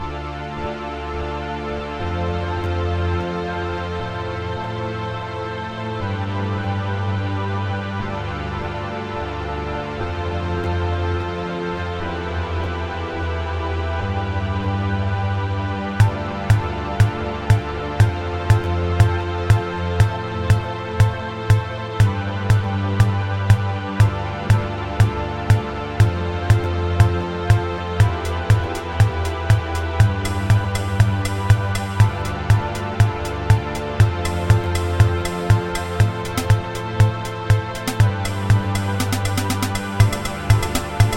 PSS460 FX

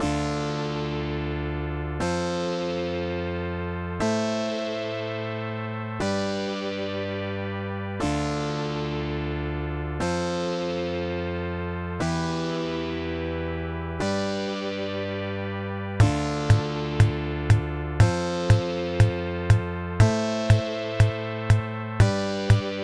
PSS460 Slap Synth DRY

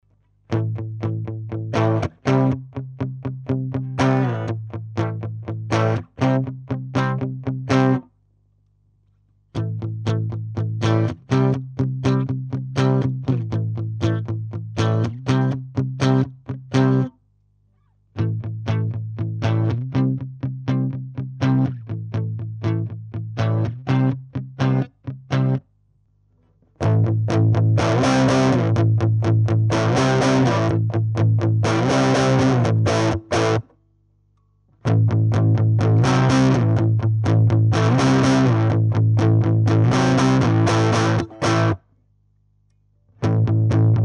Palm Mute RIFF

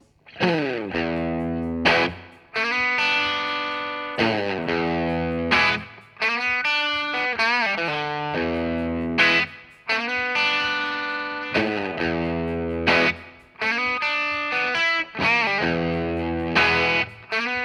Demon Tubescreamer

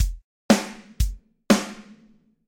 Beat

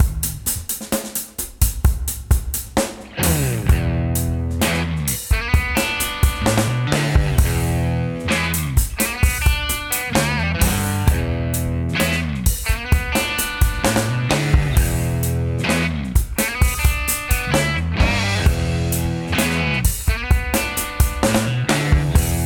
Pedal Test

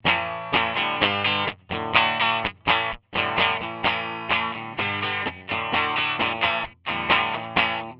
dumble

